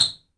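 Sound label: TOY HAMMER 2, TOYS PERC; AKAI S-Series CD-ROM Sound Library VOL-5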